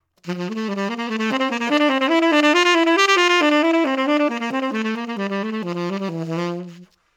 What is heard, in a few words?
Tonguing; SYOS